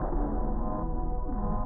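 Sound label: Evil Loop; Yamaha DTXpress-II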